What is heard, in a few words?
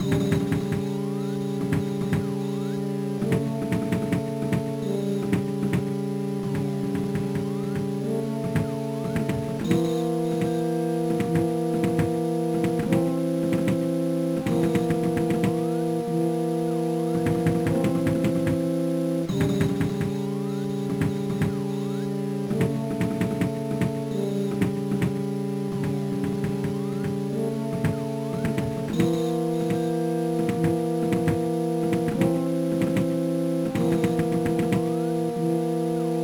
Someone's Knocking at the Door; Mother 1+2; GBA